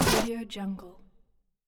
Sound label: large styrofoam block squeak